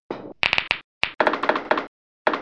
billard

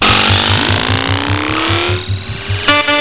HEIZER

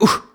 atem; Singles Flirt Up Your Life

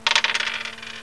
wuerfel; winpolis

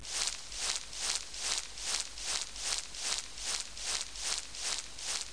Gras; Max

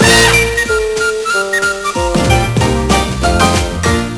Maus; SIMONS

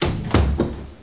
Kamin

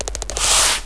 Maus

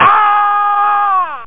schrei

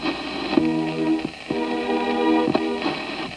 rauschen, radio

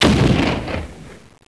Muskete; Anno1602

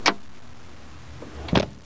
autotuer; Amiga 8-bit Sampled Voice

